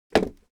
chairs bar, Furniture

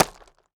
grit

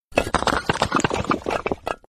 brick big

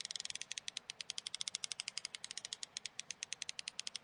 fishing not reeling

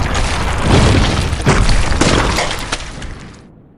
wall destroy

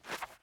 ui fishing map

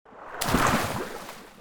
fix Water

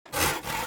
saw

